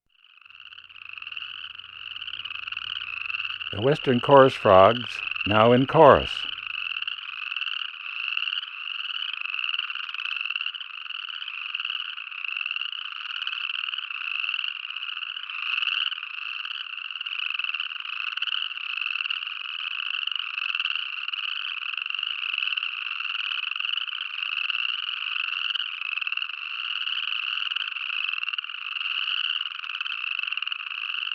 Western Chorus Frog; Western North America; Dan Gibson; Wildlife Identification By Sound

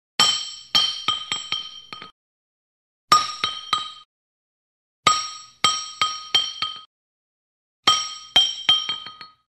Metal Taps Multiple Light Impacts, Glass Impact; Hollywood Edge Foley Sound Library

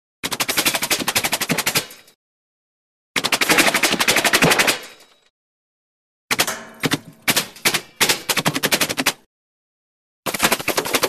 Bullet Impacts Metal Multiple Bullet Impacts; Hollywood Edge Foley Sound Library

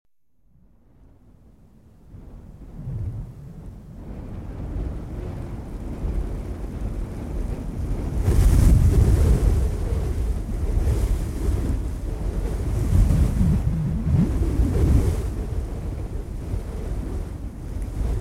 Medium Wind Gust Blowing Leaves

